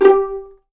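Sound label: G4 pizzicato forte left, violins; East West; The Ultimate String Collection; VLNS PIZZ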